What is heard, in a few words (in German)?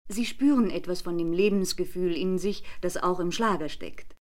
Schlager